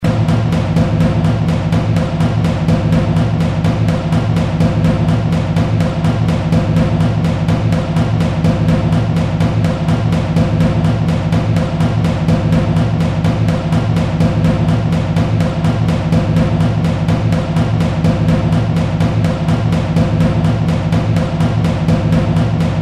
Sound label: tension Loop; Dynamite Digger Eureka